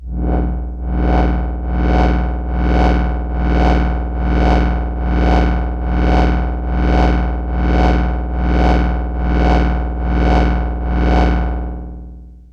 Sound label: loop, techno acid